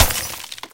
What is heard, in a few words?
hit